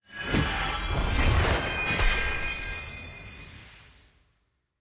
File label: celebrate upgrade; littlelegend